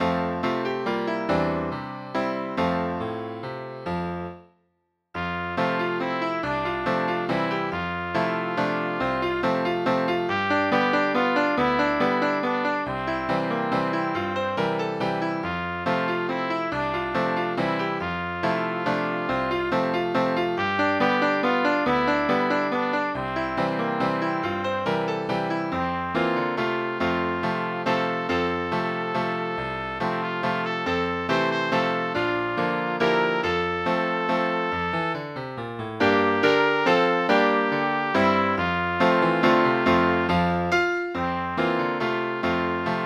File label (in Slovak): kdeže si anička, kde tú vodu brala - pre trúbku a klavír